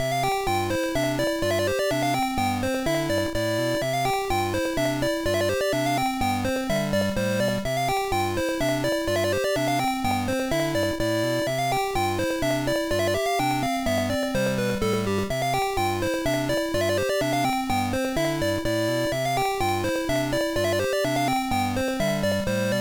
BGM; card party 2002 gba

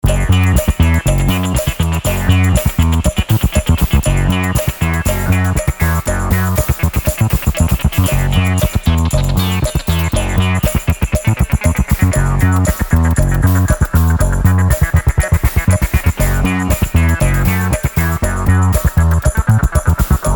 Percussion Power; Destroy All Humans Path of the Furon

